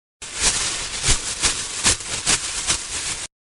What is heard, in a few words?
bush; خش خش بوته ها